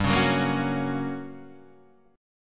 G Minor Major 7th Flat 5th, G, down, Guitar, Standard; strummed; JGuitar